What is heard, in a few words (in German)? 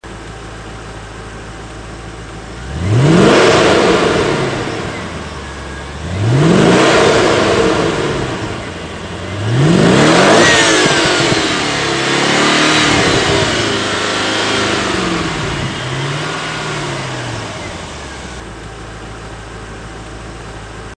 S6 Original Auspuff